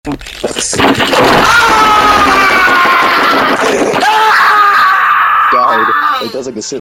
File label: rage; brawlhalla